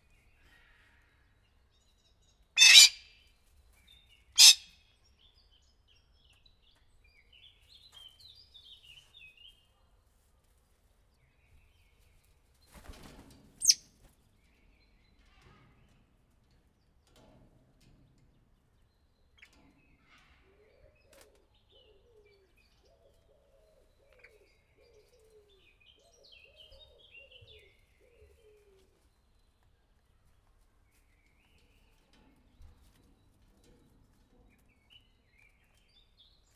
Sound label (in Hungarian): kétszer megszólaló fácán, arany fácán; felsolajos zoo 2015; cardioid